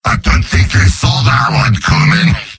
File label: demoman cheers; mvm